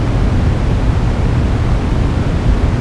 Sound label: industrial, ambience